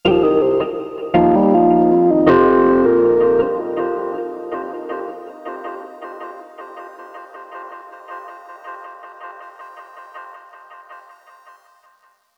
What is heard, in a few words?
Overlay Tone; Act1 Downtown